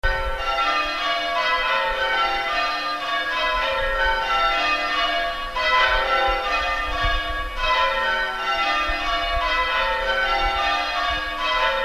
Church Bells long

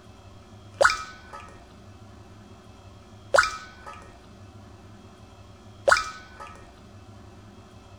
drip drip drip

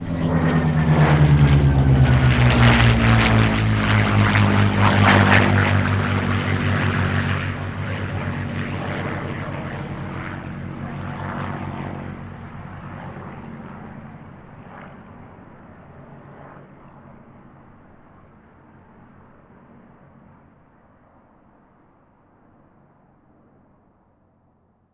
spitfire overhead

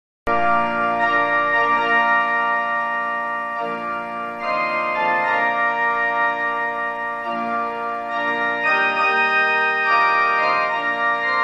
wedding march

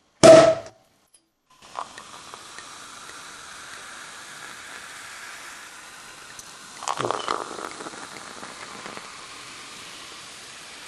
champagne

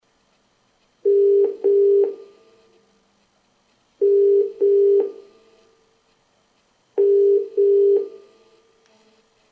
ringing

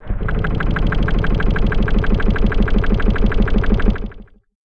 boatengine

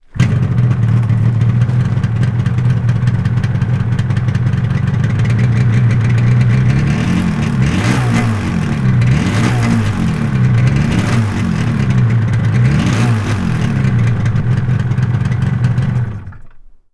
yamaha rd350 motorbike

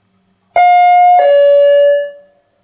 ding dong

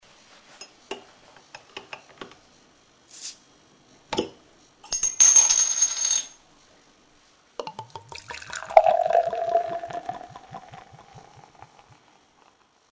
beer

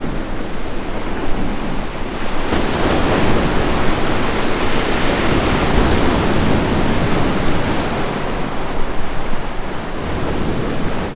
rough sea

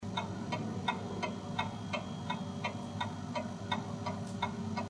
tick tock

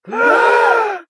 mixed group gasps

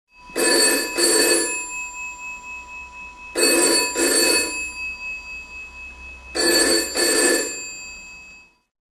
phone ringing

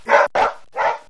dog barking

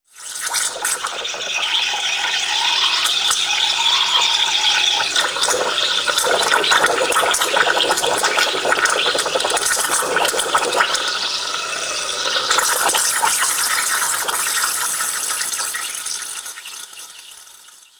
cappuccino machine

